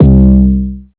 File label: hip hop